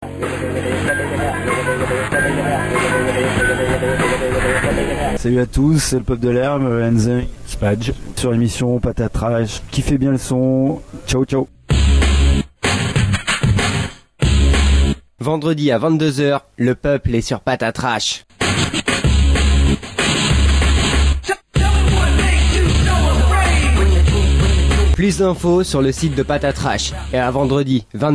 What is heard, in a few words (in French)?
jingle